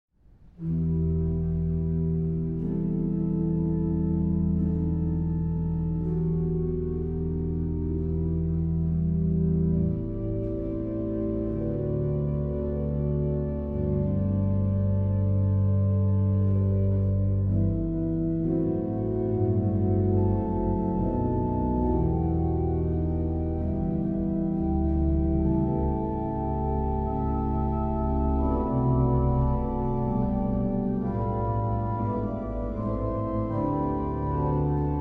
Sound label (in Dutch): Improvisatie; Psalm 43